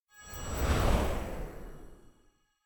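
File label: electronic gather wind; anemoi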